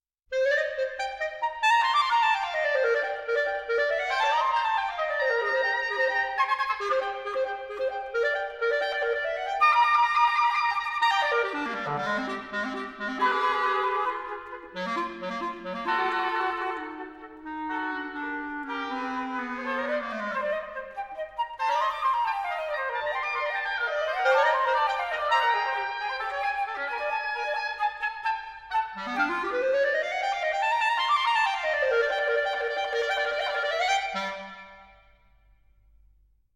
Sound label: Flute, Oboe and Clarinet Vivace; Divertimento; Op.37 III.